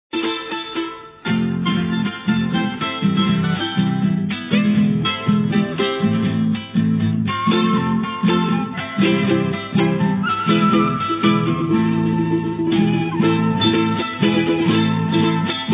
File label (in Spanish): Charango